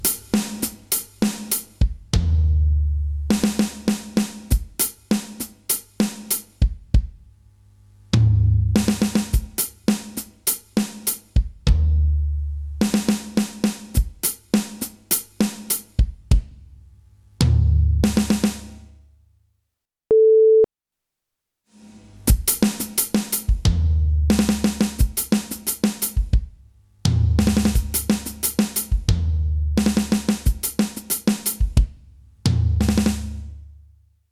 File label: groove, drums